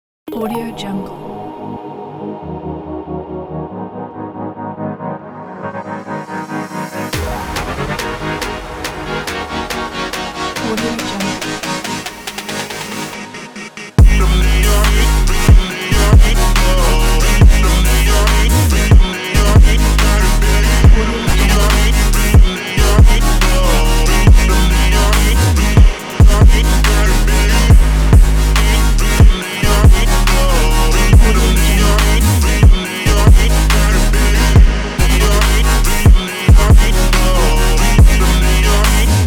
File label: future bass; abstraction